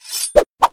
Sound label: Attack; Finja